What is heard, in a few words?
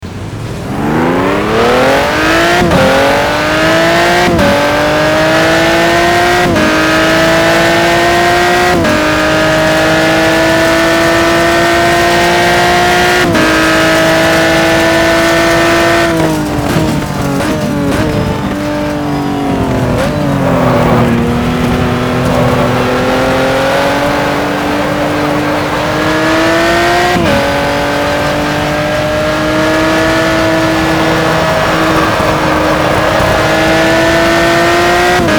racing; gtr2; fia